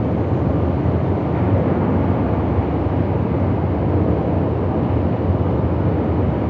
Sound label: interior, ambient; nuke